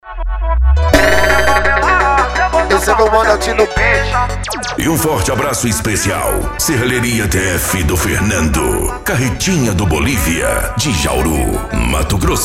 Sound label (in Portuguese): som automotivo